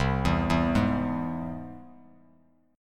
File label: C Augmented down Bass Standard; arpeggiated; JGuitar